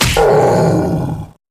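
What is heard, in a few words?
free spins grunt hit heavy; wild worlds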